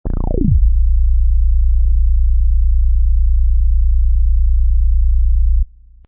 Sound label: Deep n Creepy; High quality stereo deep and creepy background sound excellent for evoking fright and fear, perfect for horror-thriller genre; Scary Tone Sound Effects